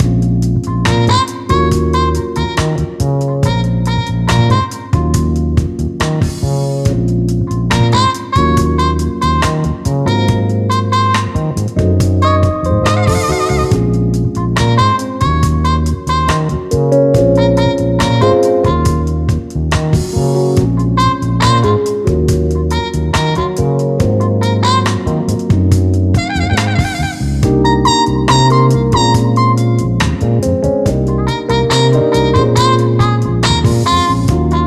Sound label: Smooth, ringtones, Gigaset; GS290